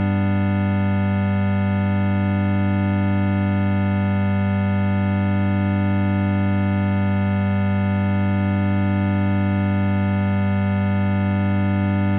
g chord, Omnichord; OmnichordGodot

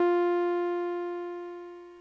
bbm, Omnichord; OmnichordGodot

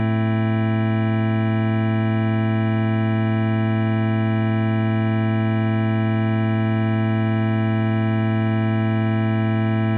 am chord, Omnichord; OmnichordGodot

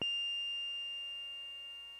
bbm, Omnichord; OmnichordGodot

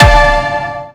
killsound; HitAndKillsounds

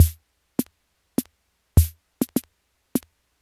waltz, rhythm, Omnichord; OmnichordGodot

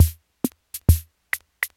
swing, Omnichord, rhythm; OmnichordGodot

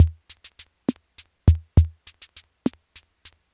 slowrock, rhythm, Omnichord

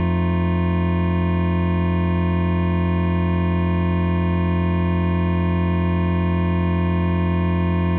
f7 chord, Omnichord; OmnichordGodot

